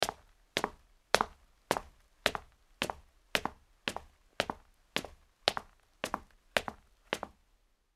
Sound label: Footsteps Hard Concrete Down